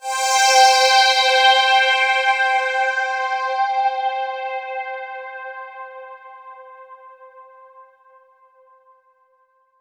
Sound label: C4, Roland JV 2080; MG INTERVAL